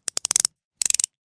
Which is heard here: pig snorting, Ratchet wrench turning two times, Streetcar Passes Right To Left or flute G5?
Ratchet wrench turning two times